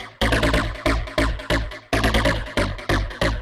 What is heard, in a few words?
future rave samples, 140bpm, A; Boingo